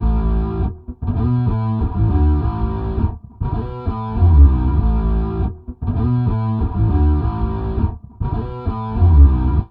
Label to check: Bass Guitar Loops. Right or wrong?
Right